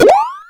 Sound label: Stomp; Wii